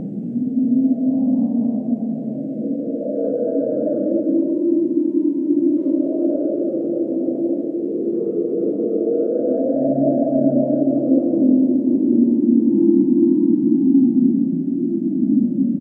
hole amb, ambient; hl2

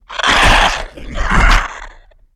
Monster - Vocal Take Damage; Monster Within; Bearer

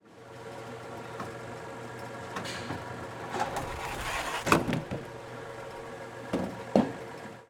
Bowling Machinery Ball Return; Soundopolis

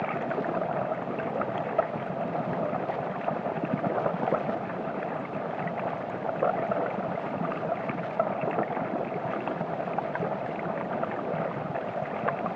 FOUNTAIN Underwater, Generous Gushing Flow; Articulated Sounds; 100 Fountains

